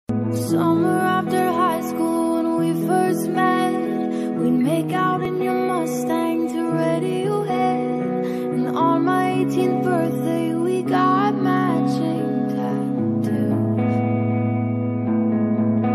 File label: slow english ringtone